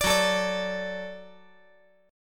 F sharp Minor Sharp 5th, F sharp, chord; strummed; 19,18,17,17; 5G,4C,5E,5A

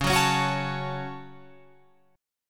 Db Suspended 4th Sharp 5th Guitar Open F; strummed

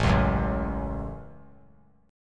A 6th; strummed

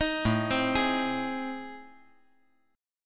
A Minor Major 7th Flat 5th

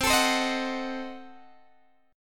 C Diminished 7th C, Guitar Open G

